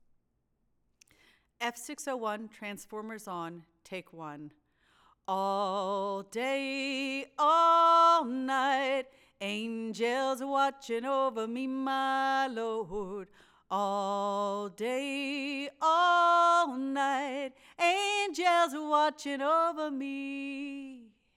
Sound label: Vox; mic pre shootout